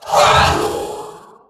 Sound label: Attack